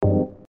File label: click